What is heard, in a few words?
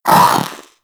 Zanuka Companion Vocal Bark; Pets; Warframe